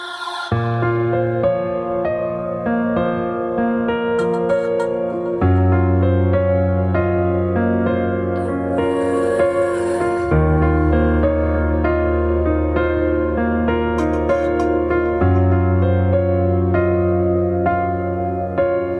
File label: bollywood ringtone